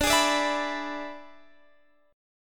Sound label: E 7th D, guitar